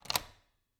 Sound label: Cabin, front door, deadbolt, lock, latch, thick, close, wide; Eiravaein Works; Vaeyan IV